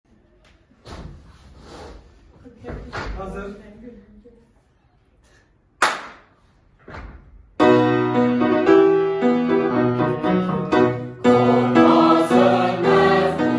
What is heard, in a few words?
Turkish national anthem